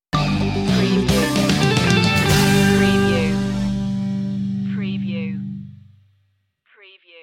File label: shopping centre stinger